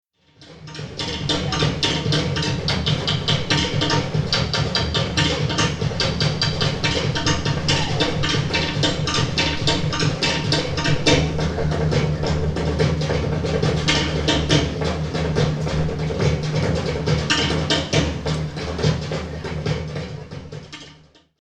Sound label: tahiti drums